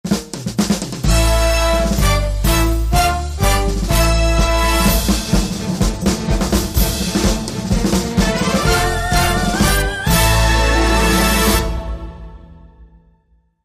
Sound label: Epic Intro